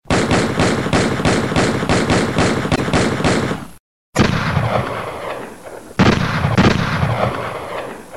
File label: Firing Bullets